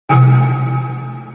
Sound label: BMW COMERCIAL RINGTONE